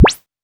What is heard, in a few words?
Technox fx; Quasimidi